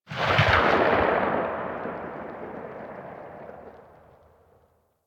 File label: Laser Aim Rifle Tail, Weapons; Grineer